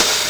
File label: TR707, Nintendo Gameboy LSDJ